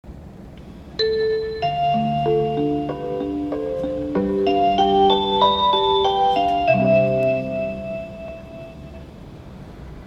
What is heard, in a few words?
chime